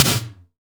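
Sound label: SDS V SNR, snare; Best Service; Real Mega Drums VOL-1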